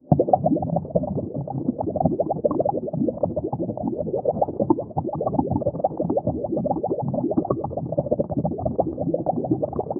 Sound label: underwater world with bubbles and marine life